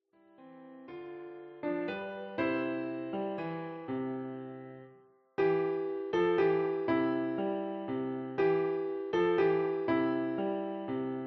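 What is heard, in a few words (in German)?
Klavier; Stille Nacht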